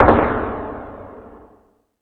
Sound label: FX Sounds, Drum Machines